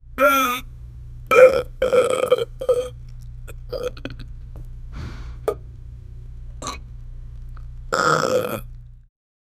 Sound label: man vomiting